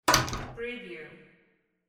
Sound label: glass door close